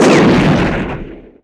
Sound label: bomb break; HeavenStudioPlus; karateman